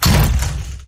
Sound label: chainsaw turnoff; tfa starwars melee weapons pack